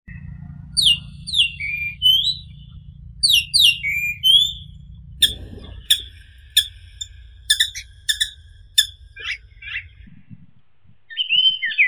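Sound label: chinese grosbeak, eophona migratoria; dubonos malii chernogolovii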